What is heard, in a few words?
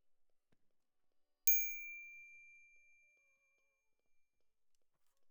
Glock Close